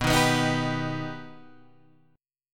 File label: B Augmented B Guitar Open G, chord